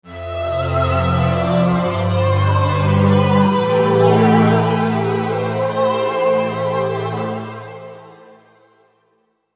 saw pad LA pcm; V-Synth; A choir that is encoded by VariPhrase.

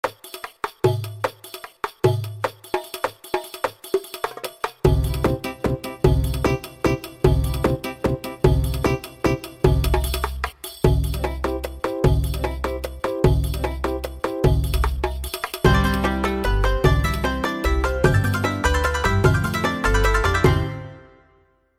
Waltz OR C maj, Middle East; gw-7

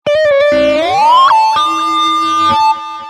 Lead, v-synth

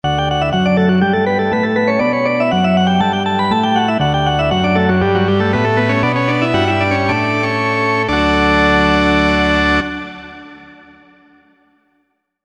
Church Organ, V-Synth

